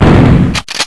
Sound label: shotgun; gusanos promode